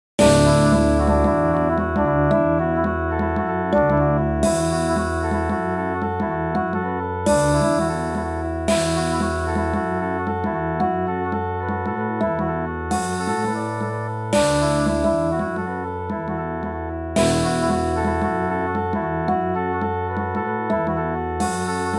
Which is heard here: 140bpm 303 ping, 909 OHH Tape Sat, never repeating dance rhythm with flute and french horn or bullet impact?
never repeating dance rhythm with flute and french horn